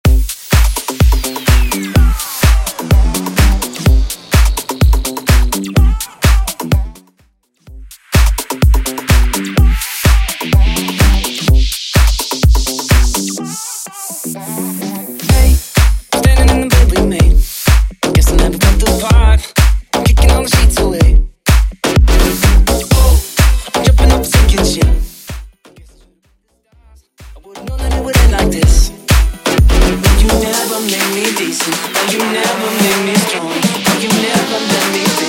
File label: EDM Bootleg Quick Mix 126 Bpm